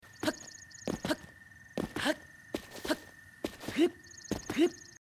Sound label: jump; Zelda - Breath of the Wild